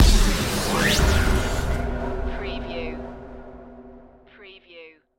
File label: Hit Digital; Production Element; Sound Effects Bible